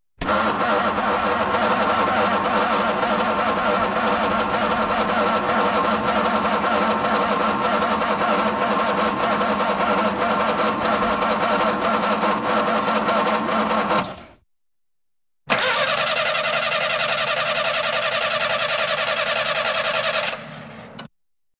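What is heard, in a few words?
zvuk startera avtomobilya zazhiganie motor ne zavoditsya, car starter sound ignition engine does not start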